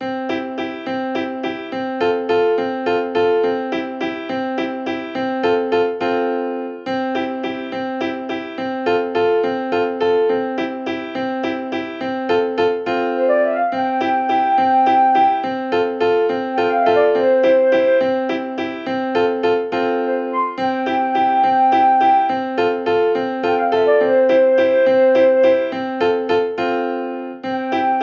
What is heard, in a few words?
sawayaka walts